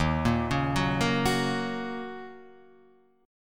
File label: D sharp Minor 7th D sharp, down, Guitar, Half Step Down